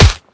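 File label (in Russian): меткий удар кулаком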